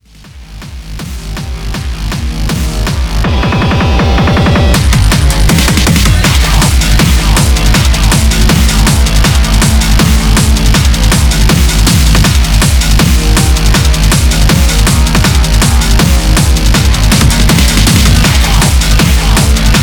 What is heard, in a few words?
metal